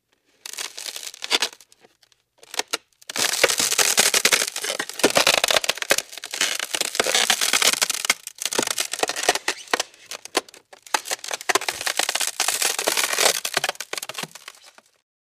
Ice Cracking Squeali

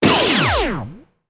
Laser Zaps

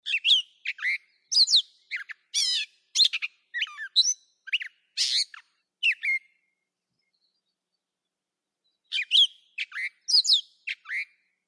Catbird Long Song; catbirds

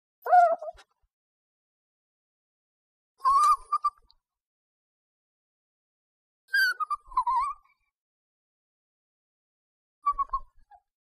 Dog Sml Whimper Sad

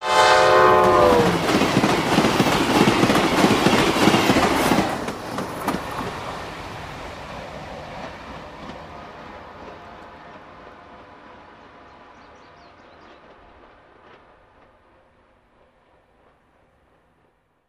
Train Horn Blo, doppler horn bys; Amtrak

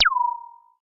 laser; lasers

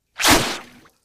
Bullets Slice Water

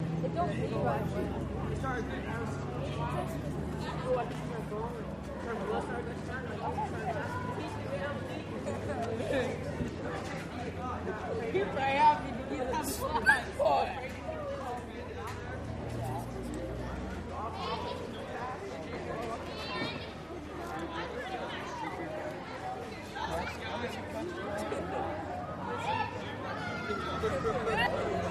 School Courtyard Stu, crowds ext; School Courtyard Student Walla

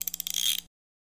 Ratchet Sml Metalli, ratchets

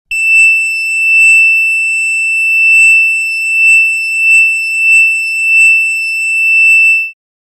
Alarm Alert Beep Osc; hi-tech-various

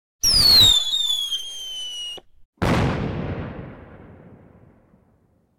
Bottle Rocket 1 Picco, fireworks

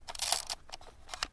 Single Camera Clicks

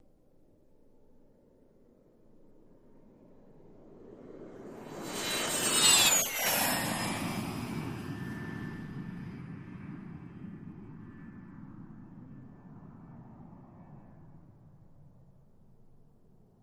Lear Jet Pass By Fast, overhead bys

